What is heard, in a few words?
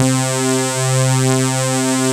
MG SYNTHS; Pro-Rec - Synthsonic; JV ANALOG